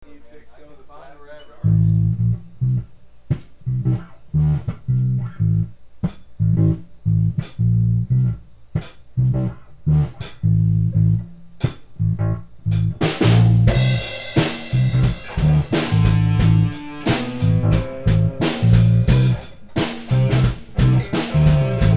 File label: jam